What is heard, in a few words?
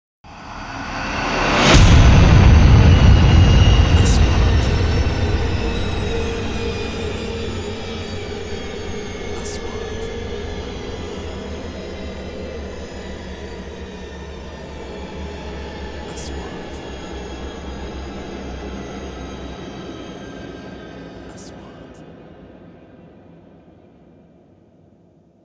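3D SFX Trailer; مؤثرات تشويقية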